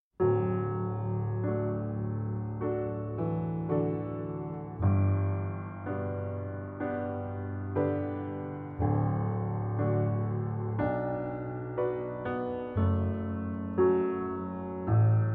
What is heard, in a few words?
Piano